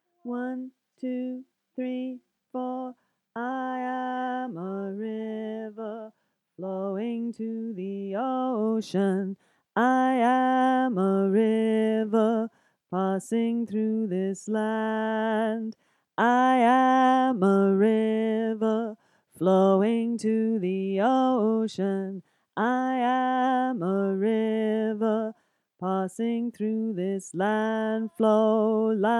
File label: River tenor